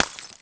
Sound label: ice light collision; sfx